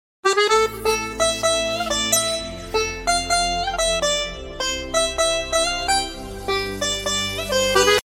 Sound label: lullaby